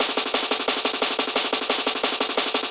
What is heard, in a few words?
177 filter snares, DnB Breaks; 141 to 185 bpm; Ethex